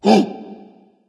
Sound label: breath dragon; fafnir